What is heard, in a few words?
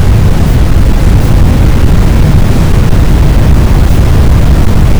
engine